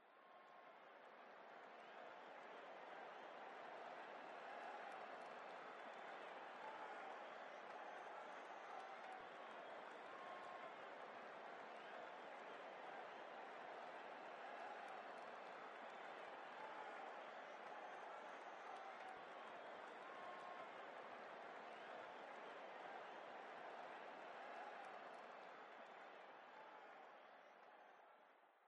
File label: Pits Pit ambience; NASCAR Thunder 2004